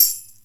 Tamburine, Zoom RT-234; 300 Drum Machines; Kit C

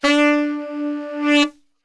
D 2 SAX; Best Service; Brass Super Section

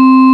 VIBES C4; Big Fish Audio; Synth City

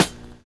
BUZZ FILL; Univers Sons - Kitch 70s

